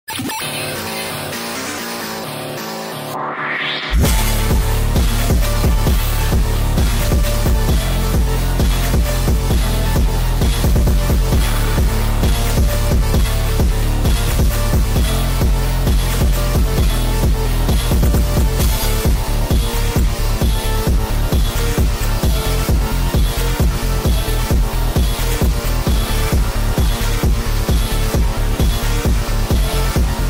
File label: Instrumental